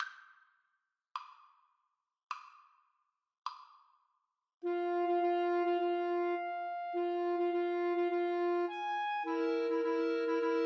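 summer 2nd movement four seasons clarinet trio karaoke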